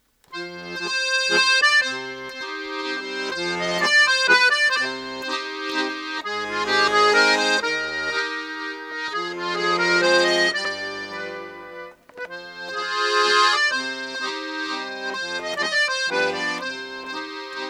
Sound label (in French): accordeon